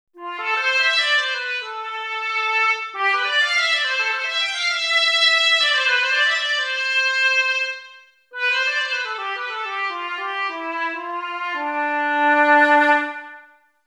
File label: flut; wallander instruments; wivi player